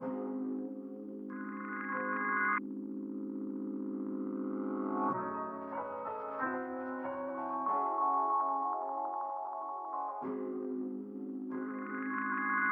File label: Melody 94bpm C min